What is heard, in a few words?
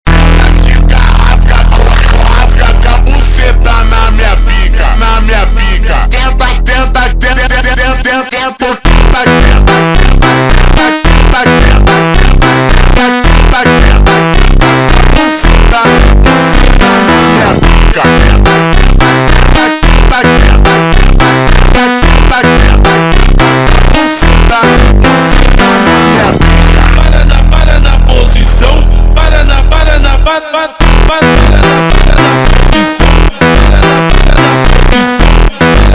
slowed reverb